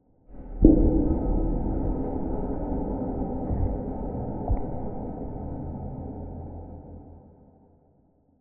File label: Cinematic Metallic Hit, Boom, Trailer, Sub; Cinematic Hits & Impacts; Jake Fielding; Haunted Metal Vol.1